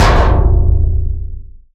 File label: heavy metal impact iron plate; Bluezone Corporation